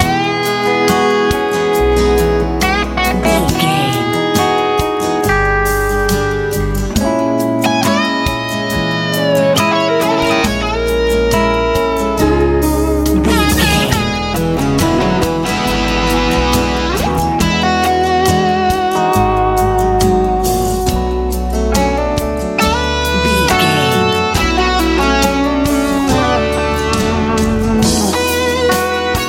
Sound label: sunny rock ballad